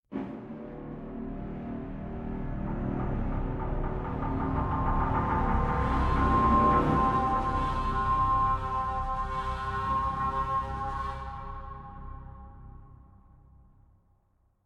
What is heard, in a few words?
stinger; Hunted: The Demon's Forge; Ch2 Well to Foyer